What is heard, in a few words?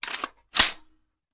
Machine Gun Foley; Sound Ideas; Guns